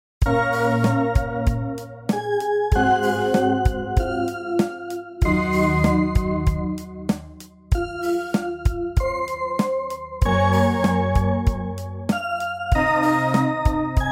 SoftSynth with Drums; Music Mouse

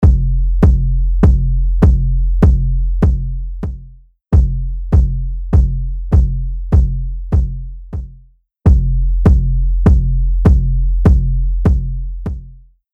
Kick Limiter; Omnipressor

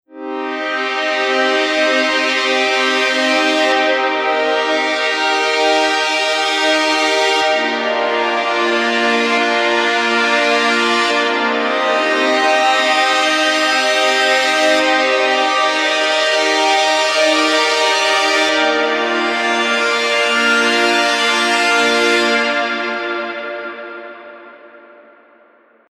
Ambient Space Strings; Preset: Ambient Space Strings; Generate; Newfangled Audio

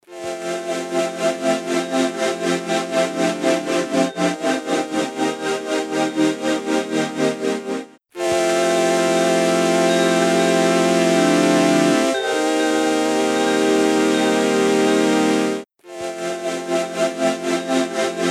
Synth Pad 8th to 16th Sine; Undulator

